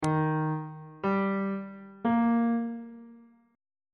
d sharp lydian chord 1 is d sharp major on bass clef